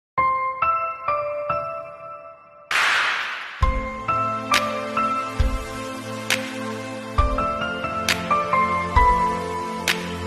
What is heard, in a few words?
Mobile Ringtone